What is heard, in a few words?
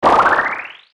super jump pickup